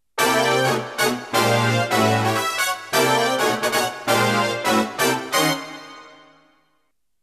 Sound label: yamaha ex